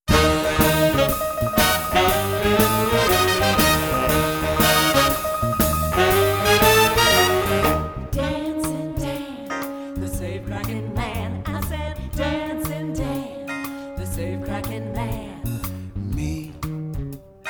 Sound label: Theatre Jazz